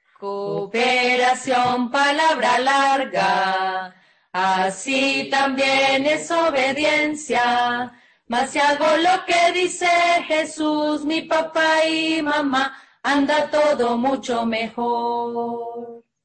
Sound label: Cooperation (Spanish)